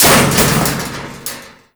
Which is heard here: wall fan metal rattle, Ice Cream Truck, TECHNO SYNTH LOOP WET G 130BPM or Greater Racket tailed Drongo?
wall fan metal rattle